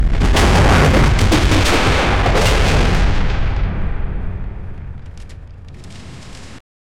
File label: heavy metal crunching; destruction